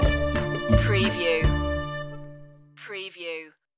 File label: russian romance stinger